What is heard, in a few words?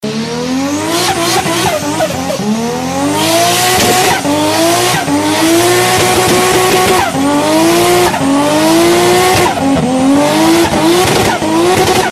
Toyota Supra - Turbo